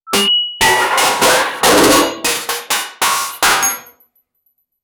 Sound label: radar beeping sound, heavy machinery